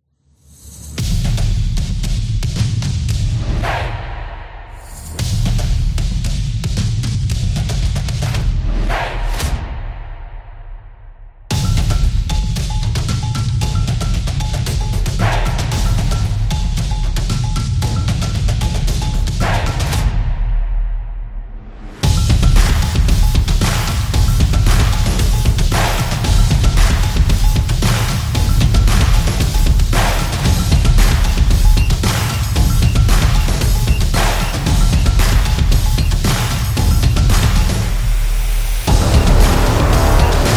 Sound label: The Sport Beat; soccer drums